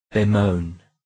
bemoan

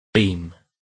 beam

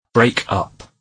break up

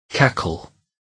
cackle

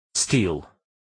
steel

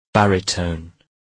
baritone

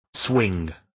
swing

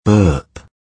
burp

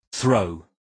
throw

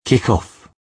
kick off

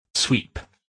sweep

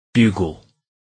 bugle

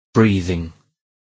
breathing

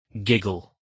giggle

